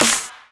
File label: Clap; Freaky Boys